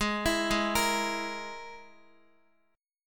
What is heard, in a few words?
Ab Suspended 2nd Sharp 5th Ab down Guitar; Absus2#5 arpeggiated